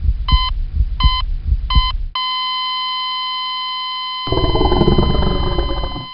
zombie win

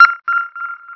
pipe beep

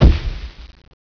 zombie banshee pulling fail

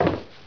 jump

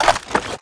xm8 clip out, weapons

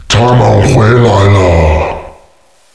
zombi comeback

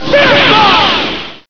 spirit bomb; shmod

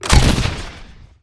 launch 40mm

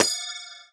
grenade hit

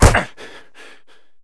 hit kevlar; cstrike

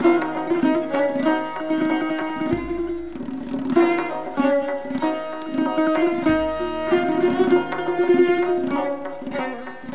dust music, ambience

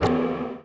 shadow strike missile

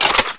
usas foley, weapons

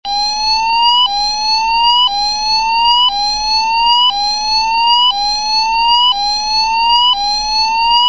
alarm

